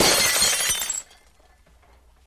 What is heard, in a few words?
Glass Break Long